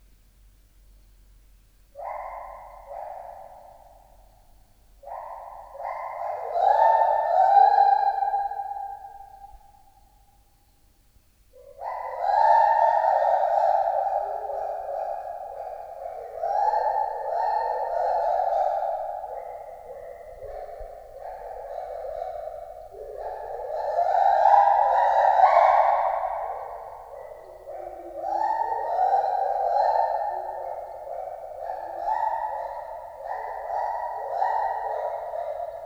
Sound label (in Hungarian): kiéneklés, tücsök, fehérkezű gibbon; debreceni zoo 2012; marantz puska